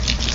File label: squish sound